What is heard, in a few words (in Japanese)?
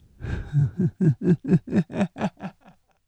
warai kimochiwarui; フフハハハ